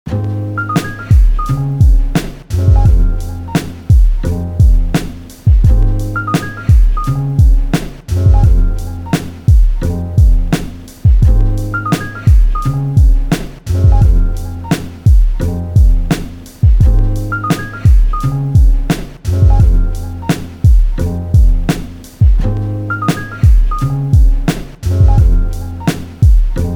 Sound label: Fortepiano; The Repertory Vol 1